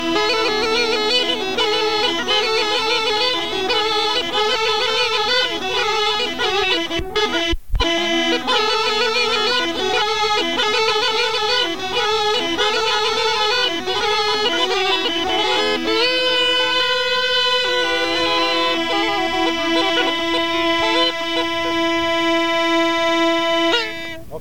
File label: Sitnije, Rodope Gajada